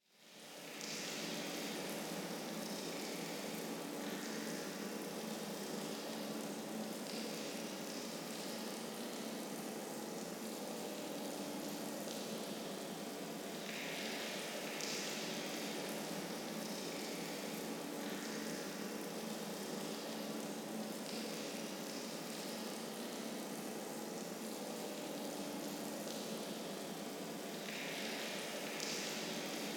Underground Water Ambience - Streaming Water, Deep Cave Tones Or Underground Sewer; Hollywood Edge; sound designer's toolkit v2